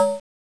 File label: Saw C2